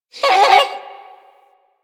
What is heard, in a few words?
Forest Creature Mid Medium; Warframe; Grineer Forest